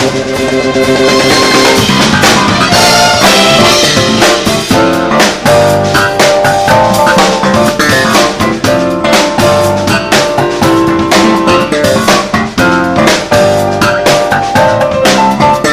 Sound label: beam, bass